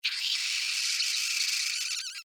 Insect Voice Pain, Insect, Voice, Pain, Vocalization, Bug; Sound Effects:Animals:Insects; Blastwave